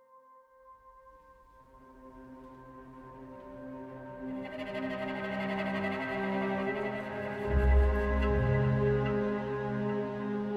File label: Concerto in E Minor for Violoncello and Orchestra; Elgar; Nevermore Academy Orchestra; Wednesday (2023)